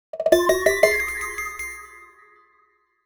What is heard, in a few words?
Get Item Fanfare; legend of zelda the link's awakening; Secret Seashell Version